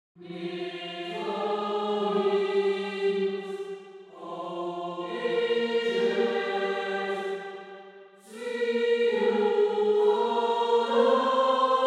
Angelic choir angels; may the wings of angels shield you from darkness

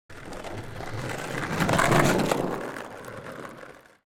Skateboard pass by fast

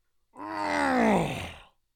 Orc creature pain

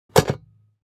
Plastic toolbox put the hammer back

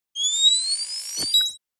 Night vision goggles power switch on off; Military Equipment

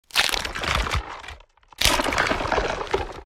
Werewolf claws extending transformation

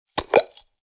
Glass medicine jar lid opening

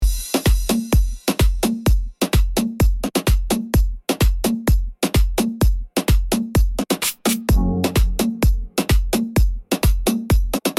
Latin beat

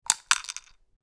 Medicine bottle dropping one pill in

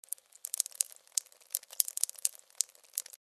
Blood dripping onto the floor; Free Blood Sound Effects

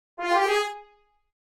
Mobile game epic alert notification

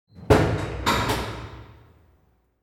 Jail door close prison distant perspective

